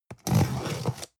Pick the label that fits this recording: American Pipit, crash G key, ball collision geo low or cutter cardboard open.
cutter cardboard open